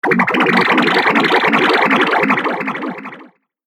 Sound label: Sticky Magic Spell; Magic Sound Effects; Fantasy Sound Effect; Andrew Fleming; Twistedtracks